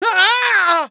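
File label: pain severe, Spanish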